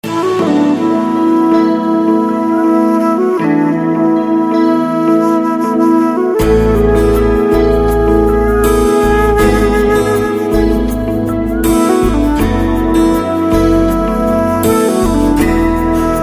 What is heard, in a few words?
nice flute